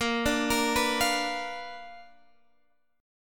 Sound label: B Minor Major 7th Flat 5th, chord